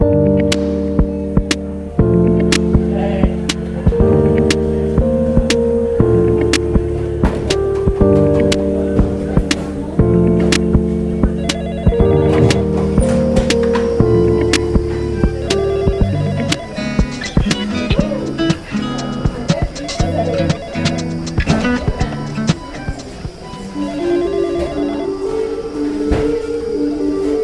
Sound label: sample hospital noise; curbing hospital noise